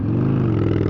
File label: game acceleration